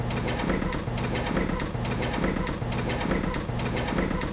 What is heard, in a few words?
boosh; cstrike